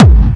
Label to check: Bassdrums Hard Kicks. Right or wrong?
Right